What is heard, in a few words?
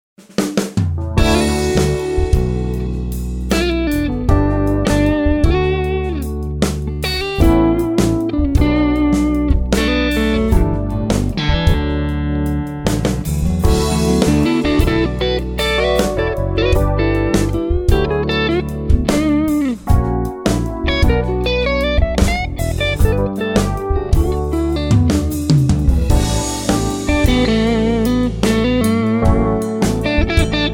Clear Blues